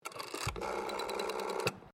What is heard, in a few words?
TELEPHONE WOODEN AUTOMATIC DIALING WALLPHONE DIAL